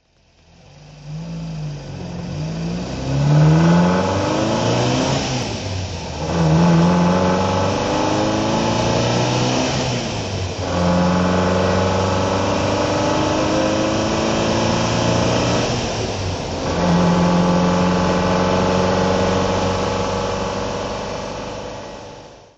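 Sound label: alfa romeo giulietta ss drive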